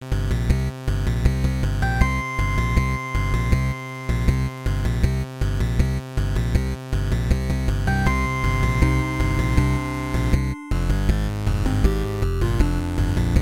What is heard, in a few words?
8Bit; The Mandalorian